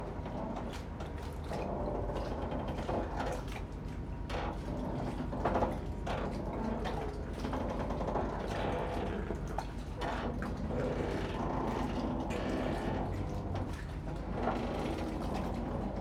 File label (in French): mats craquent